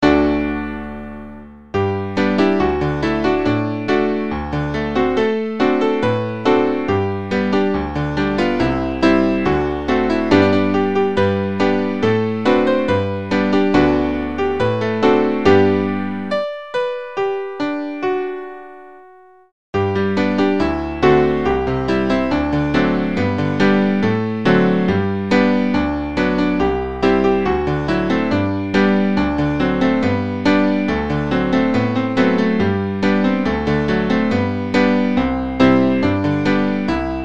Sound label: with melody